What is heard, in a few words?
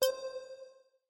coinflip win